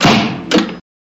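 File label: Defibrillator Whomp, heartbeats & ekg; Defibrillator Whomp, Thud Click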